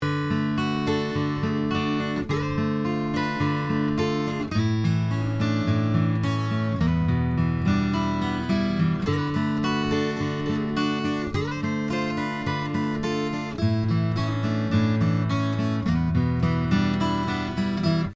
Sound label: MXL144R neck hole solo